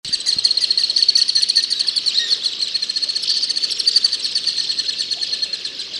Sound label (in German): Alpensegler Flug Rufe